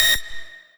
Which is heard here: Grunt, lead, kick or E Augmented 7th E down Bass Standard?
lead